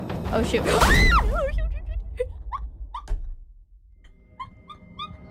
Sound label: Scream oh shoot; IRyScream